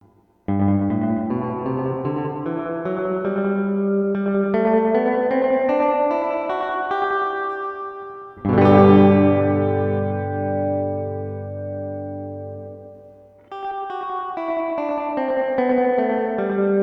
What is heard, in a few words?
Reverb Max E Major, guitars; Pedal Effects; BYOC